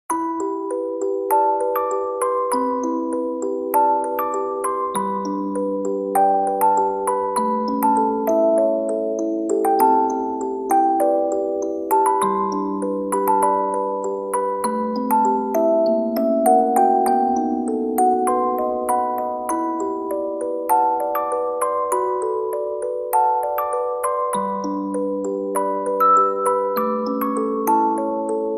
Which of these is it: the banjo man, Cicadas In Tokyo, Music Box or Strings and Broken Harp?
Music Box